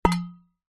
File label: household thermos flask impact empty